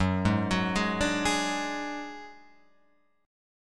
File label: Gb Suspended 2nd Sharp 5th Gb, down, Guitar, Open F; arpeggiated